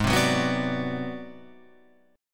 G sharp 7th Sharp 9th Flat 5th G sharp down Guitar Open F, chord; G#7#9b5; strummed; JGuitar